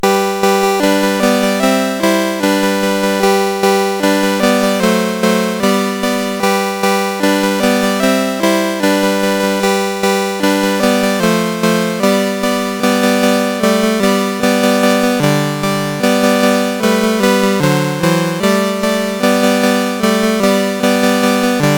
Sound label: Going up Cripple Creek, CSG8580R5